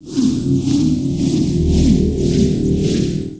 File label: tractor beam enhance; Earth and Beyond; EA Games